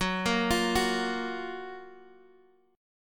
F sharp Major 7th F sharp, Guitar Half Step Down

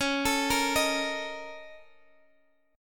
A sharp Minor Major 11th, C sharp

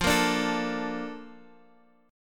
Bb Major 7th, F

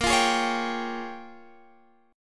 Bb Minor 13th Bb down Guitar Drop D

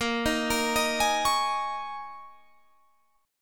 D sharp 7th Suspended 4th A sharp, Guitar

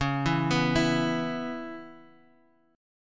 C sharp Minor 7th; arpeggiated; C#m7; x,4,2,4,5,x

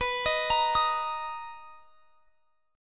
B Major 7th B down; arpeggiated

